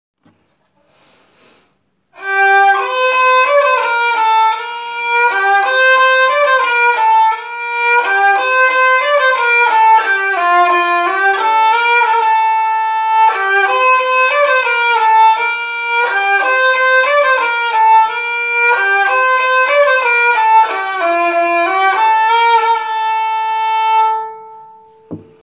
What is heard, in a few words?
violin cover tamil song; by turkish girl; Swag Movie